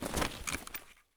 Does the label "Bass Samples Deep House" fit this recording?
No